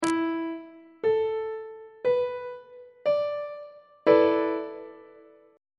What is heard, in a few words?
f flat dominant 7th suspended 4th chord on treble clef